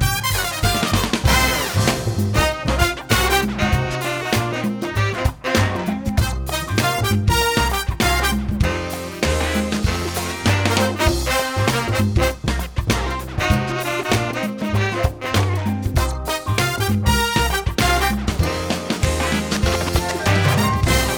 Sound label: Solve Bonus Win Music; Wheel of Fortune